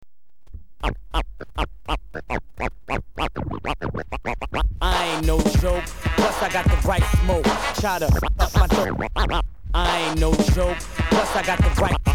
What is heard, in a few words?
hiphop